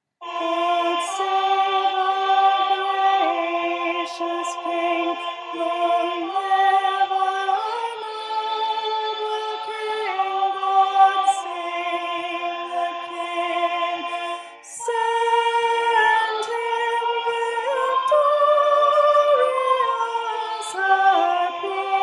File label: alto; God Save the King; Voices of Remembrance; Folk Choir music